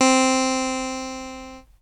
Maestro Clav Vinyl Synths C3; vinyl keys

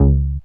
Jupiter4 Funky Vinyl Synths C#1

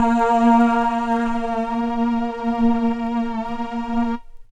VP330 Combo Wobble Vinyl Synths A#2; vinyl keys

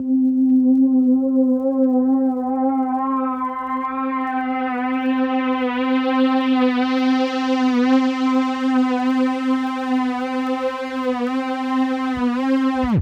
Polysix Slow Opener Vinyl Synths C3; vinyl keys

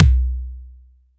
BD

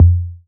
TOM

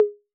Conga Hi B 808; Clean; Digital

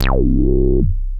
BASS

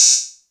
Hihat closed

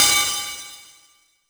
Ride Cymbal

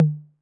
Tom Mid 808 Sat; Saturated

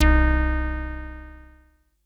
SYNTH

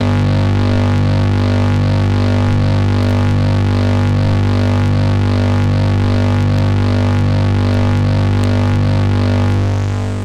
MS20 Fuzz Mod Vinyl Synths G0, bass

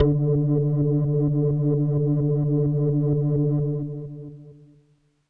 Leslie D2; organ; Kawaii

